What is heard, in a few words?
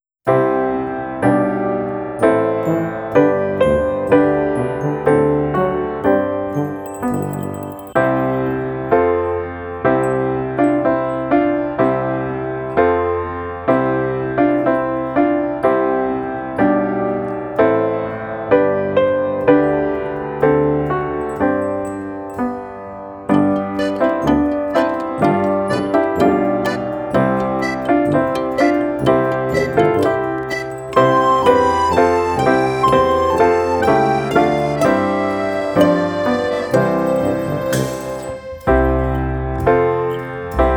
Karaoke; O du Froehliche